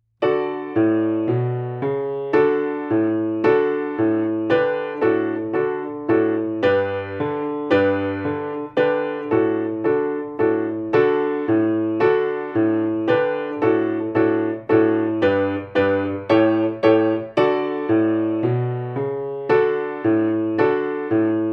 Rocket March, 112

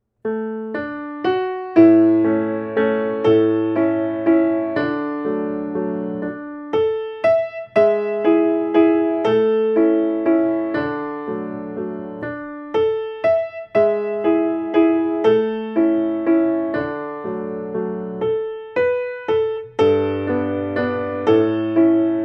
Waltz 120

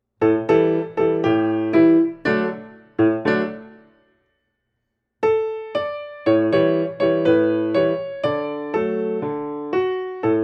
Argentina 120

